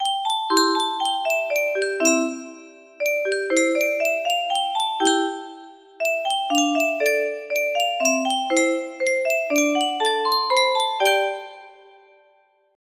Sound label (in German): yunsheng spieluhr